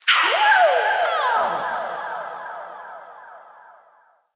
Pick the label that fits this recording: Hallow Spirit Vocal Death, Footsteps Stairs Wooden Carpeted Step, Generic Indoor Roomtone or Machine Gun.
Hallow Spirit Vocal Death